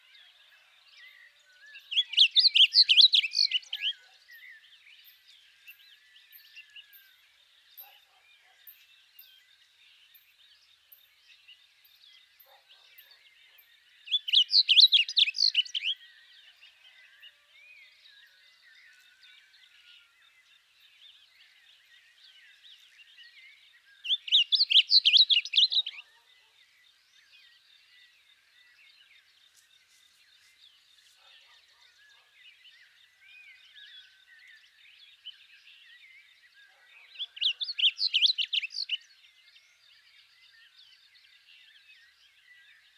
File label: Blue Grosbeak Passerina caerulea; grosbeaks